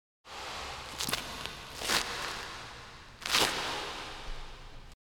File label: Step Basement